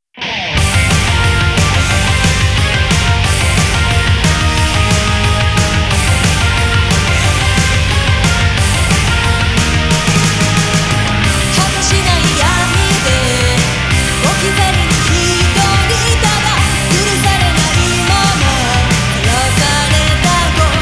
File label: Blood Drain (vocal arrange version)